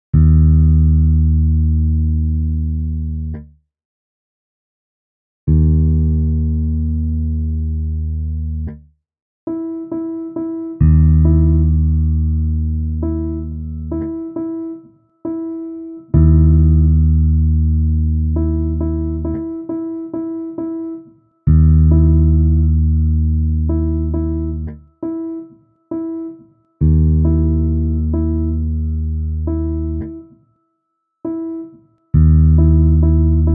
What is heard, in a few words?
Eighth Note Triplet Rests Eb Drone; reading notes rhythms plus ear training